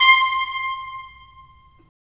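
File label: wind clarinet; Roland - LA Composer vol. 3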